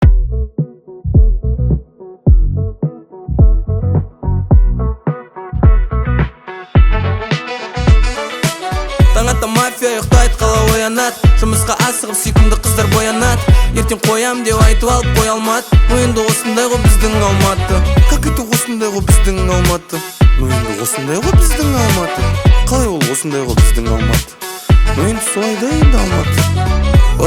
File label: Acoustic Slow Version